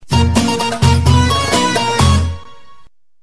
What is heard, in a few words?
Arabic Tone